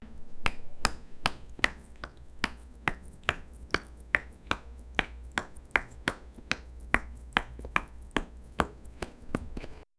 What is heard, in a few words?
spinning while dancing, dance